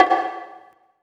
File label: Big Conga